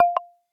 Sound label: new Message